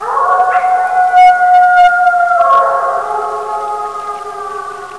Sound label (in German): Wolf, Wolfs heulen und Knurren